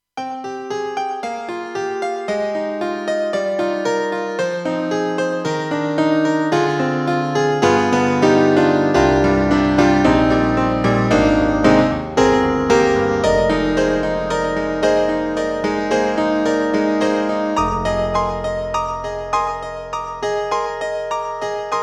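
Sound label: bright piano, KAWAI GMega LX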